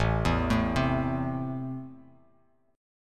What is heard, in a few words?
A Minor, Bass Standard; Am arpeggiated; 5,7,7,5